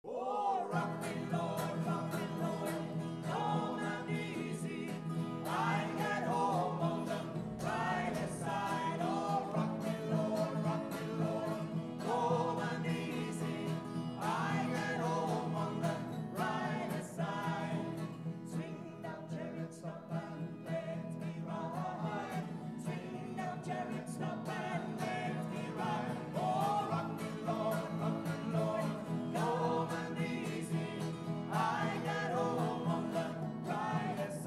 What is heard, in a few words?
Trio